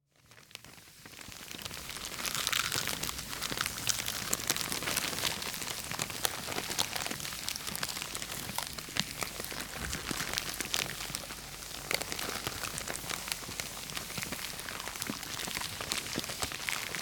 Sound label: Trees And Bush Burning In A Wildfire